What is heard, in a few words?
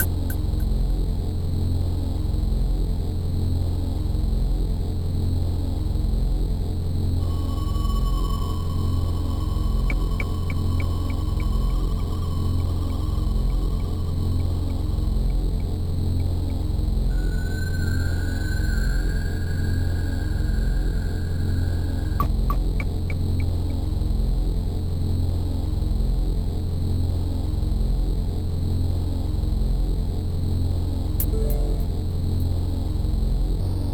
Soul Tomb Ambient; Perihelion The Prophecy; Amiga